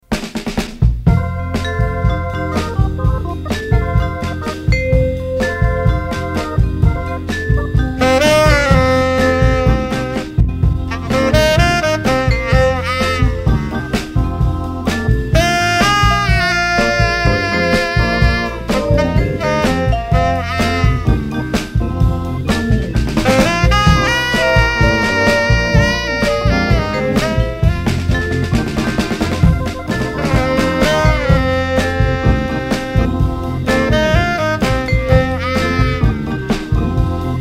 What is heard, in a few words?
Mambo Blues; Carnival Records